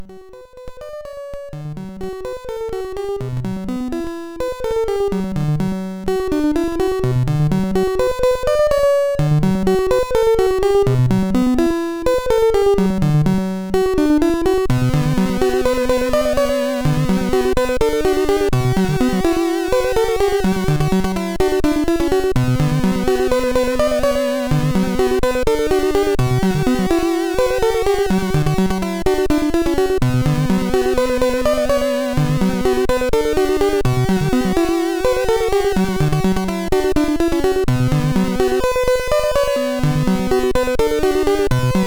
intro, sid MOS6581R3

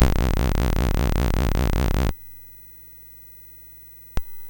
Mini Golf, MOS6581R4

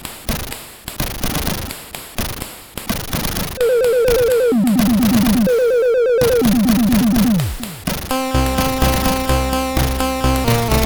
Remix, sid CSG8580R5

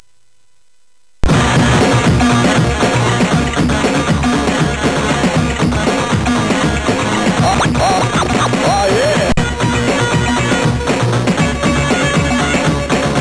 Ego Blast, sid MOS6581R4; hvsc

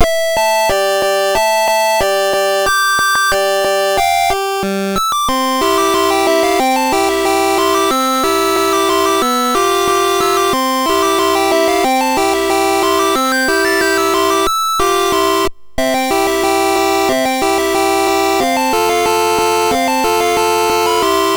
crack intro, sid MOS6581R3

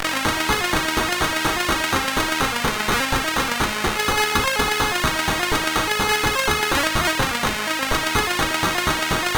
sid CSG8580R5, GAMES; Aussie Games

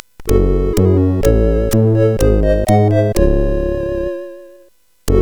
Jingles, CSG8580; hvsc

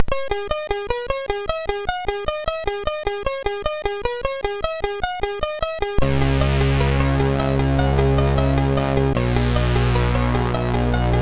revisited, MOS6581R3; hvsc

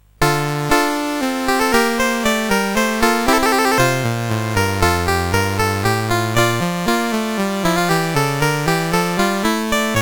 Menuet II, CSG8580R5; David W Tattam

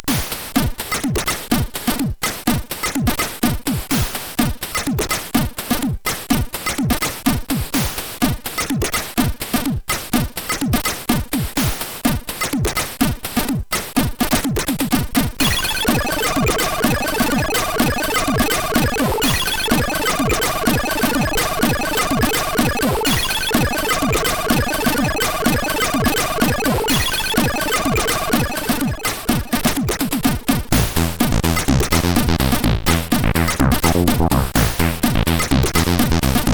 Bass and Drum, sid, 8580; CSG8580R5; hvsc

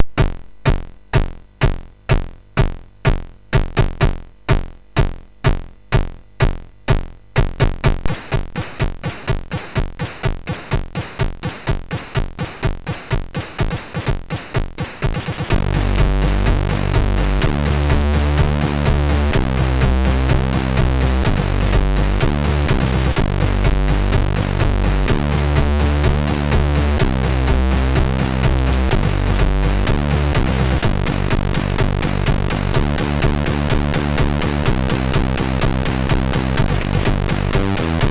death metal, MOS6581R4; hvsc

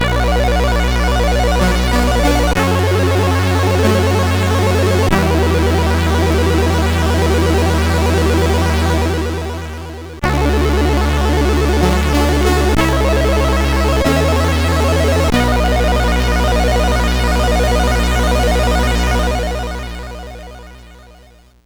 Metal Warrior 2, CSG8580R5

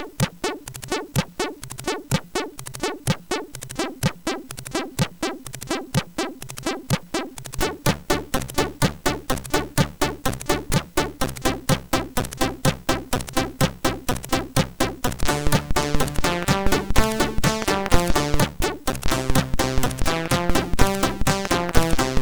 Shortest, sid MOS6581

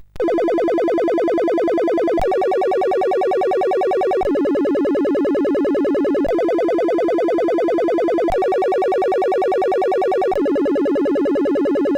sid, CSG8580; hvsc

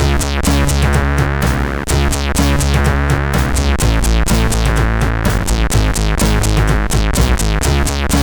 Cool Bass, CSG8580; sid; CSG8580R5

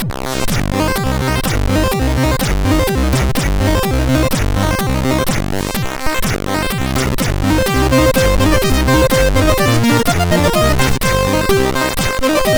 Polka, MOS6581R4; sid

